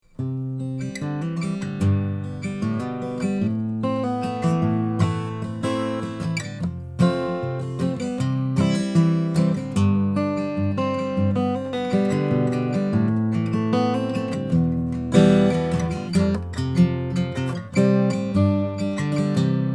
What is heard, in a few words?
Fingerpicking, Neumann KM254